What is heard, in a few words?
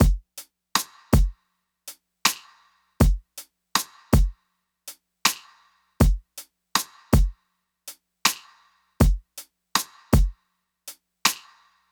loop80, funk; Pop; funkvol1